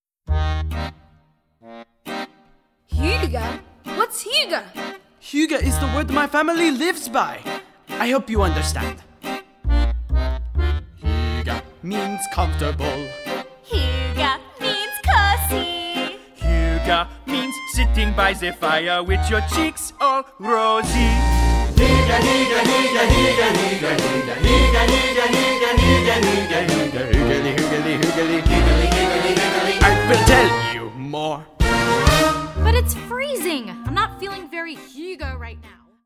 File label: vocal